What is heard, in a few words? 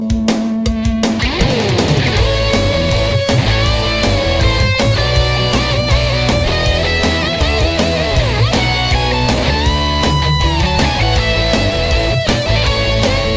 rock Christmas ringtone